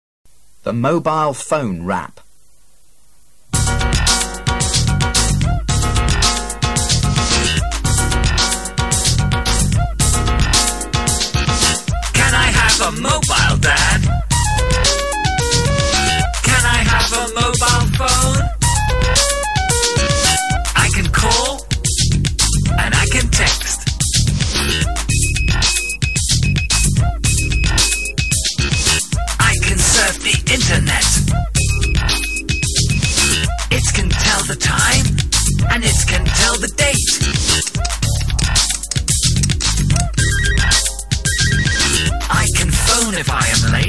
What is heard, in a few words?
mobile phone rap